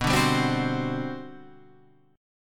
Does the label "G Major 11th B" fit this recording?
Yes